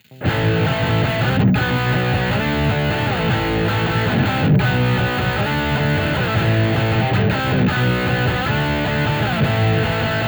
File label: lead bridge; vintage